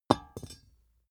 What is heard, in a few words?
small metal drop clang soft; industrie wirtschaft; metall